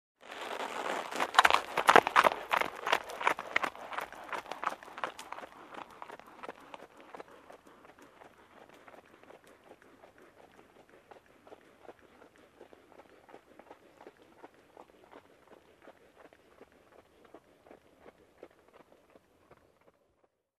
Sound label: Horse Gait on a dry mud surface